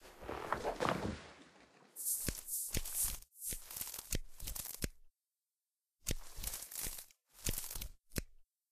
cleaning Garden

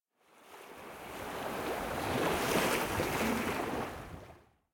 yacht enter

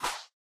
character appear

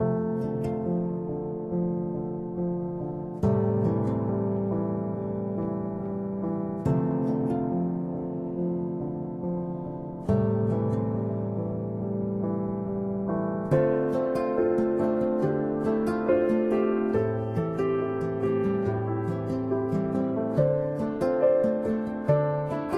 music valentine

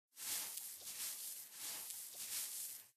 broom Clean

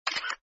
screenshot

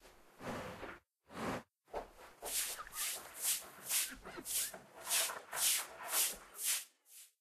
cleaning Office

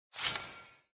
window appear

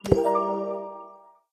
earn point; Halloween

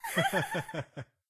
characters high five laugh

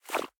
accumulate

